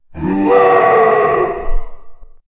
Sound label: demon chanting to battle